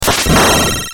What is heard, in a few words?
book open